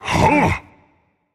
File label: confused rage; chaac